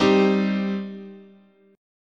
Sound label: G Suspended 4th Sharp 5th, guitar chord